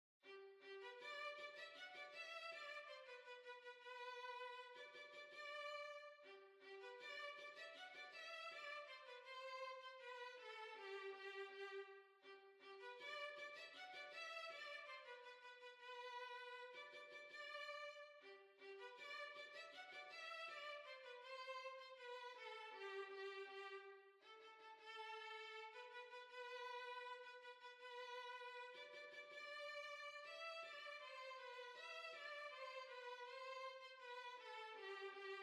Hornpipe, Violin

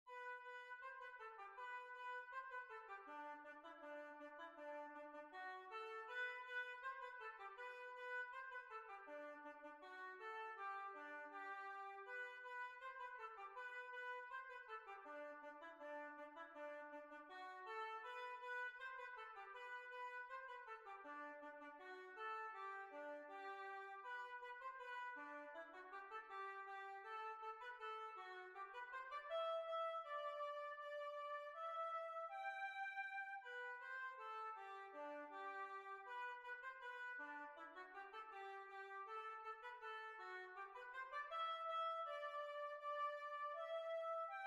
Holywells Hornpipe

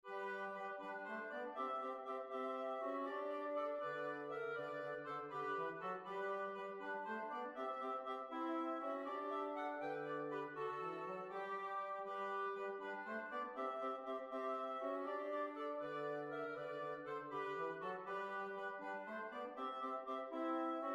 March; Rogues March

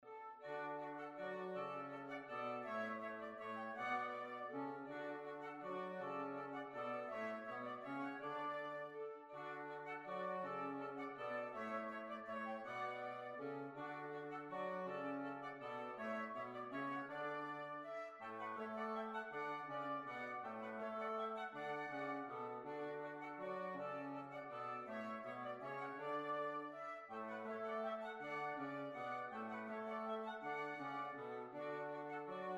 Waltz

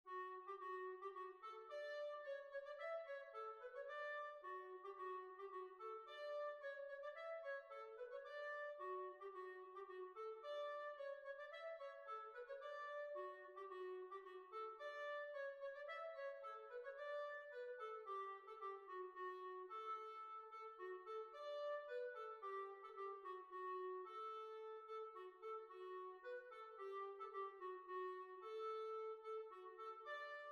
Heel and Toe Polka; B flat

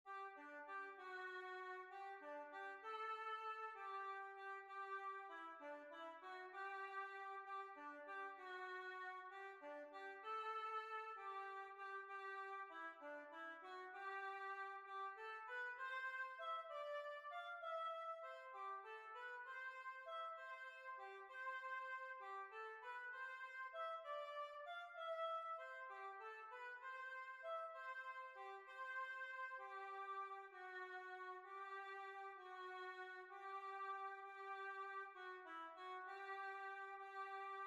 Waltz

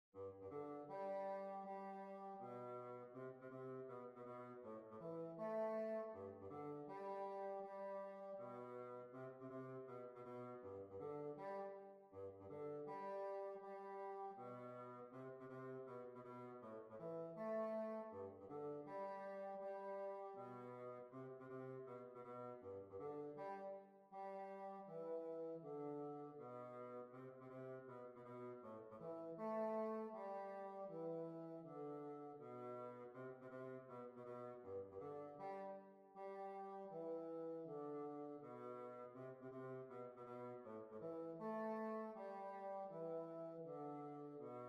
Hornpipe; Sadlers Wells Hornpipe

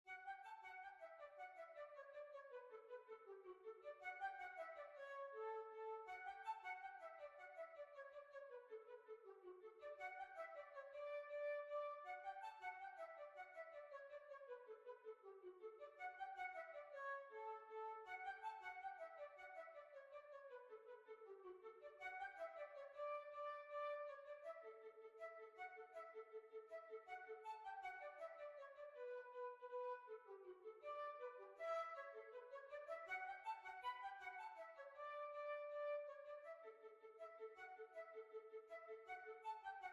Hornpipe

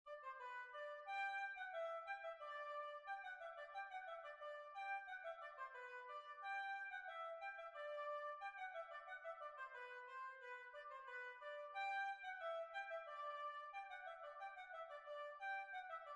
Hornpipe; Miss Menagers Hornpipe

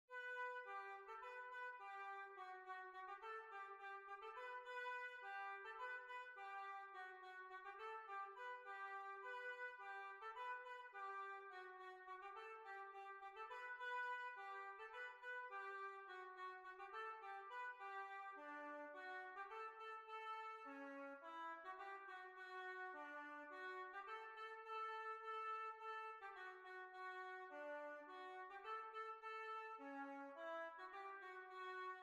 Polka

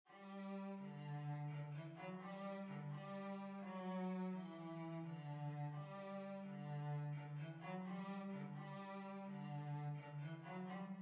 Violoncello; Bellingham Boat

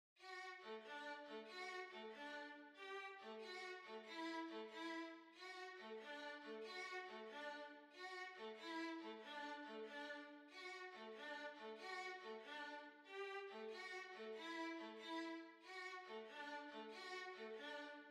Quadrill, Violin

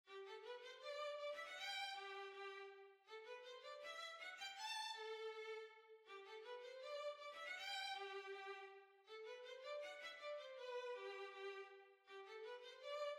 Hornpipe, Violin

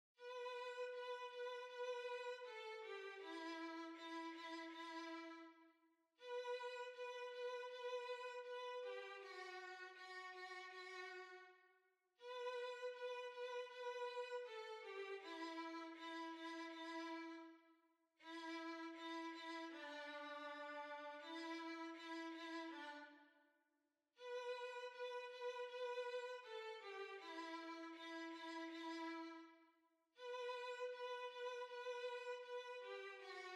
Violin

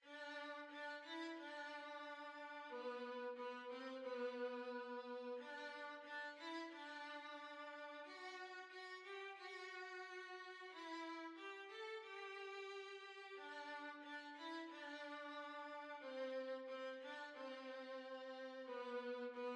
Violin

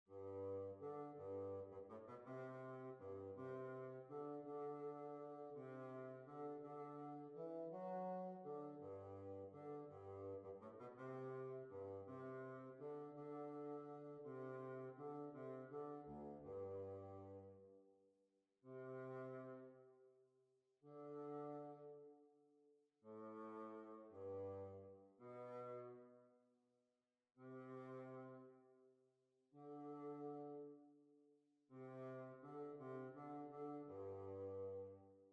Waltz

